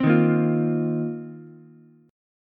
C Minor 11th C Ukulele C6; strummed; JGuitar

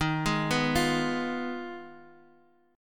Ab Minor 6th Eb

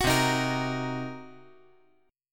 C Minor 7th Flat 5th, chord

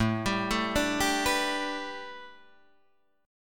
A 9th Suspended 4th A, Guitar DADGAD

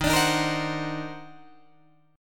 Eb Minor Major 13th, Guitar, DADGAD; EbmM13; strummed

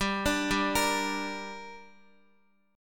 G Minor G down Guitar, chord; arpeggiated; JGuitar

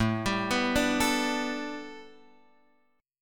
A 9th Suspended 4th, Guitar; arpeggiated

